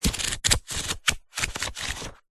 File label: household book old thick thin pages well read pages flick through; zapsplat